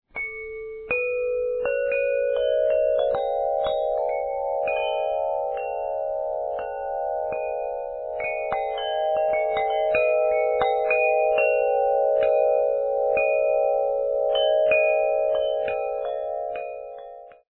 Alto, windchime

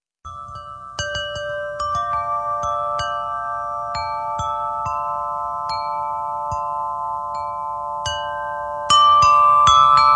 Stardust Chime, windchime

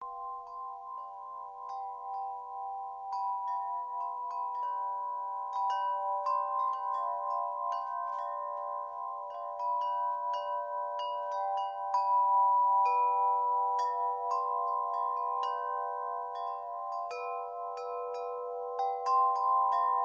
Himalayan Echo Windchime

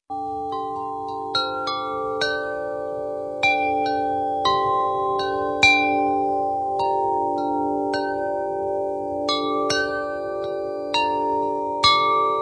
Earthsong Chime, windchime

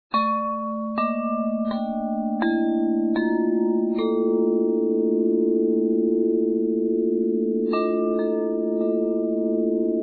Bass, windchime

